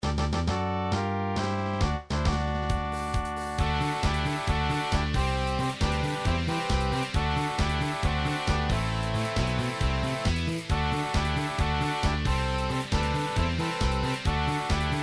fanfare; super nintendo final fantasy iii

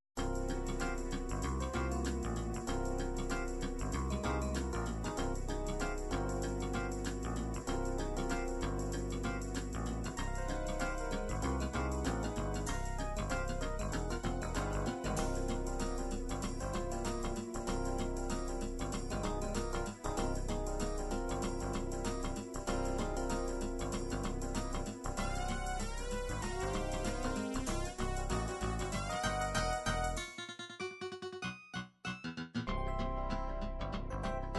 dance pop rap, midi

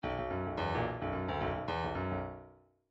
midi grooves funk

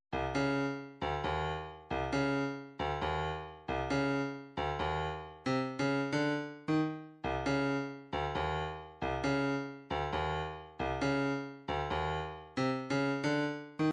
148 snare; Frenetic

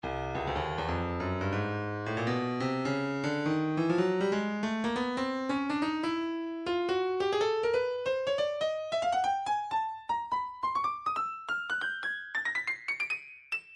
breakbeat midi 070 bpm urban tight; Stylus Breakbeat Midi Files

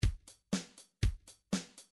drums rock, midi drums

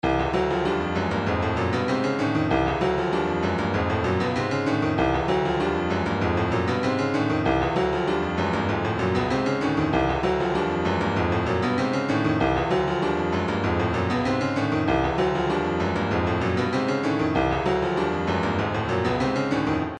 retro funk 097, midi; goatskin

